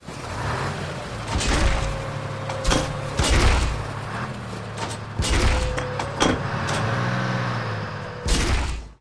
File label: idle, monster